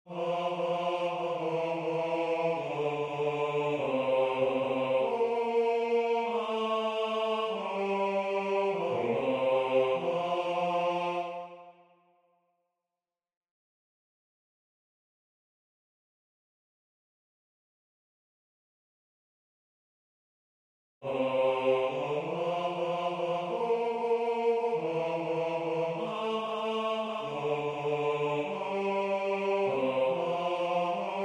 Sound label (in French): Basse; Antonio Vivaldi; Gloria